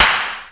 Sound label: CLAP, Drums Dance & Groove; USB Soundscan vol.11; AKAI; DANCE KIT